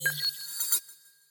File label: feature Splash Closed; multiplier mayhem